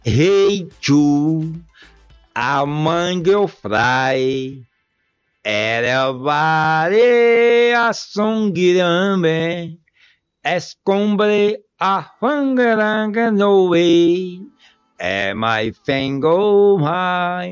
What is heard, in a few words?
cantando